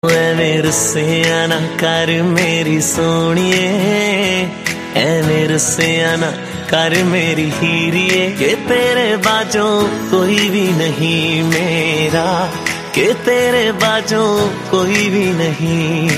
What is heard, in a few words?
punjabi song ringtone; bollywood ringtones